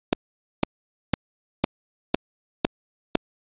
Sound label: Click Melody